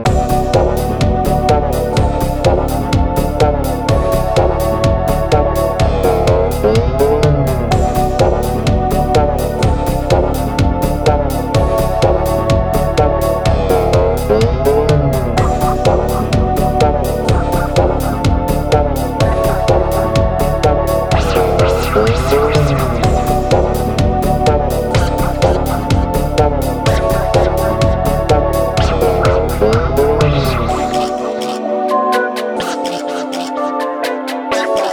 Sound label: Rave loop